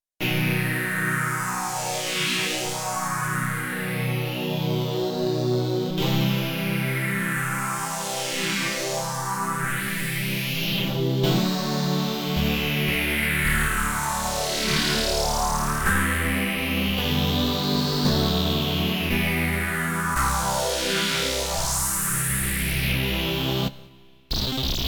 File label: X-Mod Sweep, Korg Radias-R